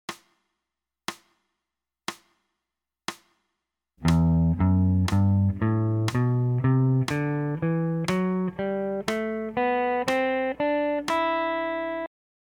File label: e minor up, scales